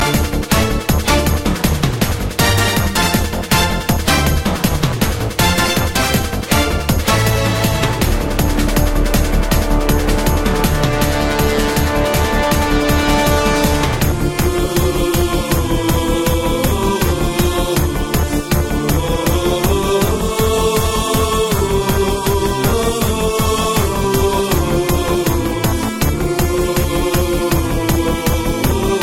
Rocking

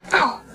"ow"

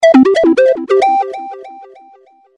Sweet Tone

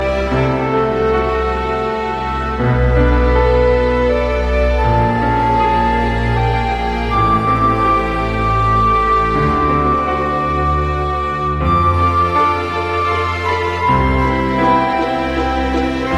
Lullaby; Zelda

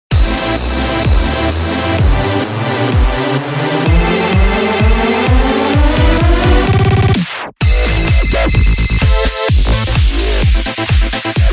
Electro Ring

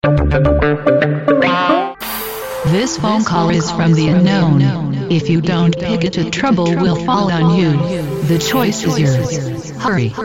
Call

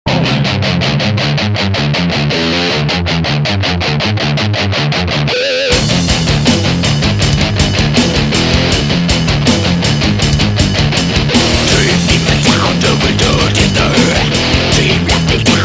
Metal Ringtone

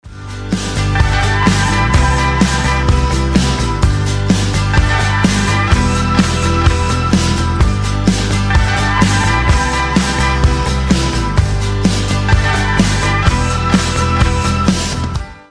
Happy Rythm

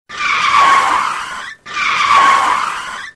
Brake

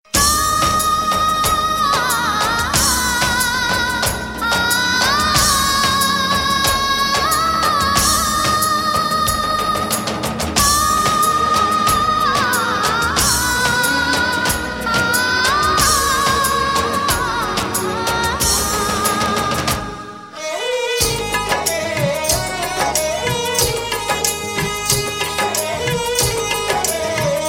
Nice Tone

